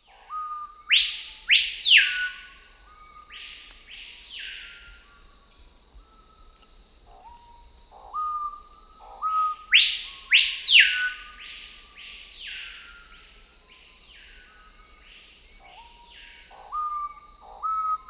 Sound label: Screaming Piha, Lipaugus vociferans, S. America